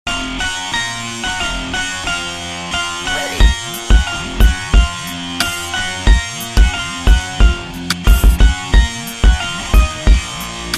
Awesome Tune

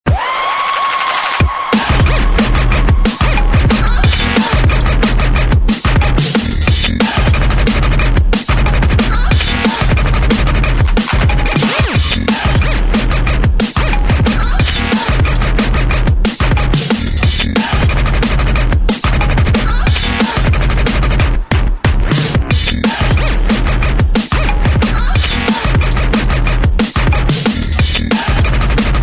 Dnb

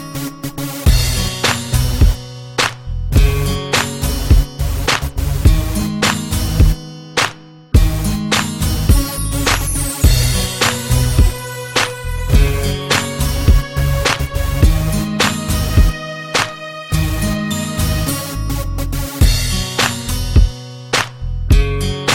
Guitar Love Beat

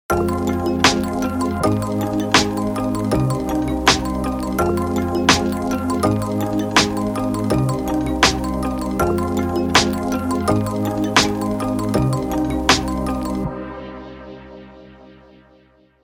Rain Beat

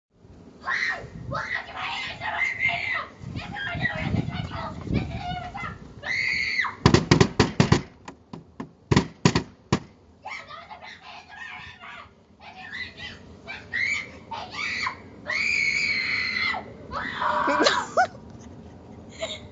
Asmr

